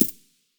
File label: aug silencer mono far, weapons; STALKER; IWP